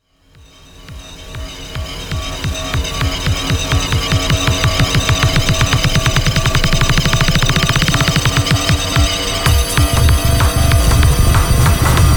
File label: cauldron; wizards